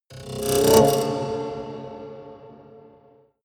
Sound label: haunted strings roll